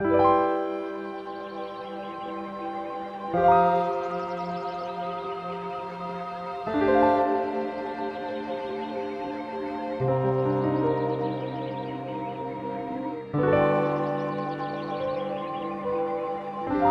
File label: C Major; Ghibli